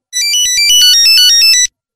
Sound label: nokia old tone